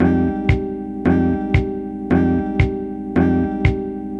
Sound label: Off Time Fill 114 G, Lounge Lo Fi Break Fillz